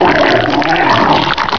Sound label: bite; hunger; hungerhound; rex